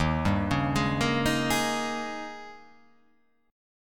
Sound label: D sharp 11th